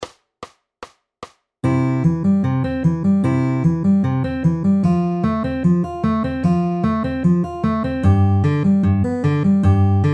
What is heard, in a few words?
C F G C pinch ring middle at 150 bpm